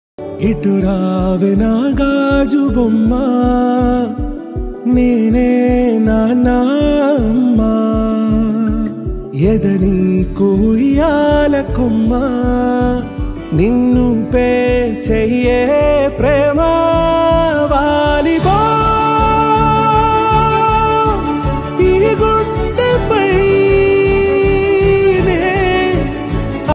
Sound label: telugu ringtone